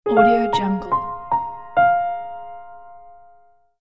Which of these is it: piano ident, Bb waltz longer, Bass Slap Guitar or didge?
piano ident